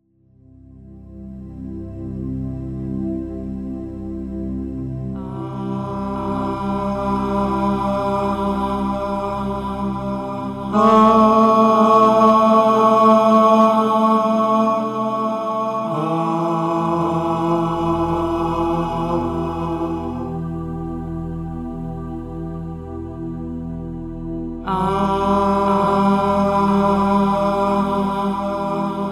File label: Sound of God AH Meditation; Manifest With The Sound of God AH; The God Frequency Meditation